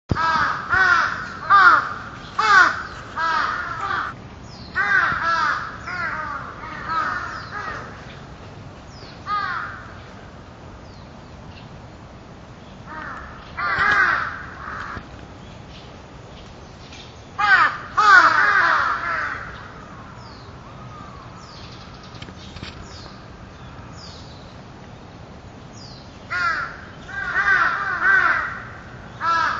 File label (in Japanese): hashibuto